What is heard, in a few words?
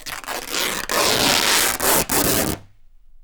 RIP, Canvas Bag Rips